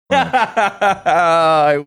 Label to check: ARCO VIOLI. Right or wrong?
Wrong